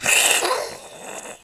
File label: Hiss Die; The Battle for Wesnoth